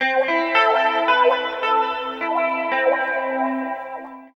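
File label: guitar, right; East West - Phat & Phunky CD2; 90's Sample CDs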